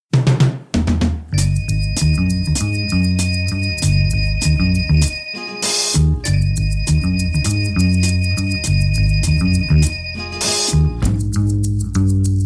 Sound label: funky Korean soul